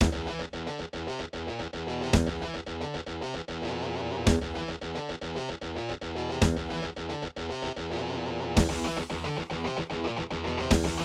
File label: korg ai20; soundcard test; Doom